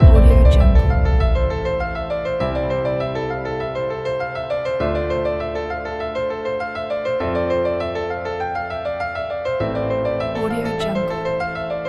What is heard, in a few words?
epic inspirational adventure drama pack